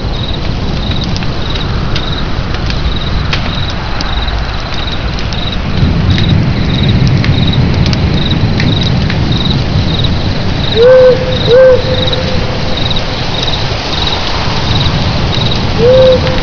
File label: fire wind; quake2